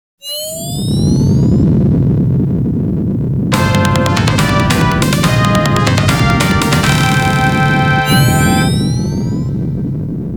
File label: Stage Clear (Stage Clear)